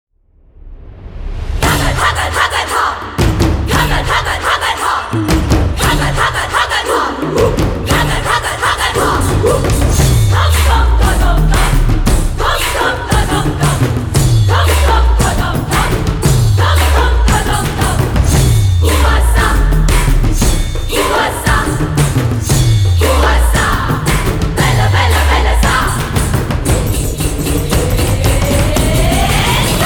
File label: Heroine Clan Entry Song - Hindi